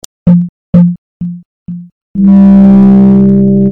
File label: Loop